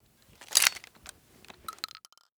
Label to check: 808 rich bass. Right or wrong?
Wrong